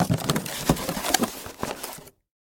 legkoe shurshanie kartonnoj korobkoj

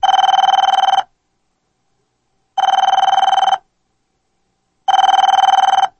zvonok na telefon kogda nikogo net

